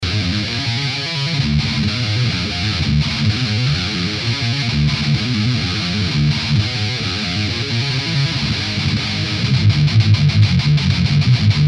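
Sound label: B guitar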